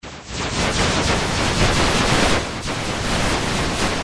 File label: saturn ring sound